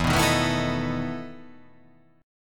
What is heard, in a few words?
E Major 7th Suspended 4th E